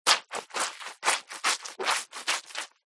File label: clothing item wet squeeze rinse multiple times